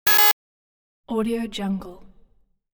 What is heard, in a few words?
beep with wrong entry sound; interface sounds